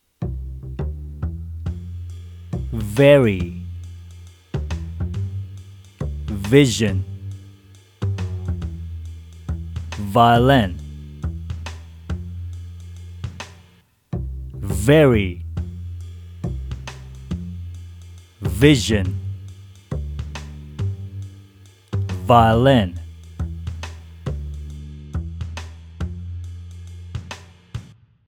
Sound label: violin